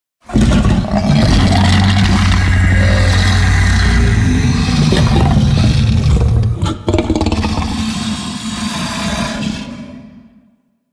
Pit Monster Death; Warframe; Infested

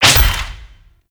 Melee Slam Impact; Warframe; Necramech

